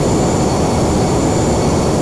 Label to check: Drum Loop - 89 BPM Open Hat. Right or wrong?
Wrong